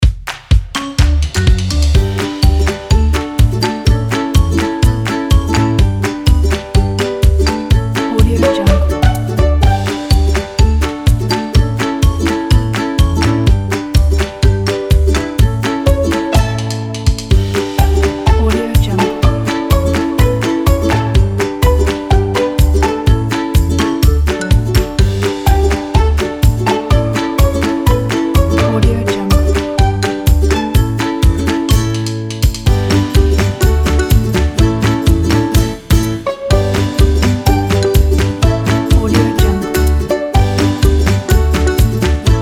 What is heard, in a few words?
playful